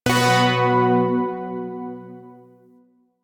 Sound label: Shawzin Chromatic Chord; Zariman Shawzin